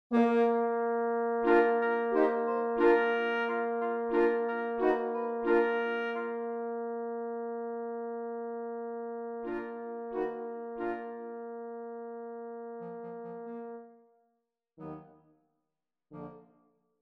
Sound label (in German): tutti